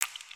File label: Click